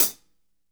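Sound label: hat closed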